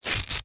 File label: surfboard leash velcro strap undo